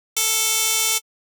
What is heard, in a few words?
Wavetable Saw PHASE DISTORTION; Pigments; Fractalize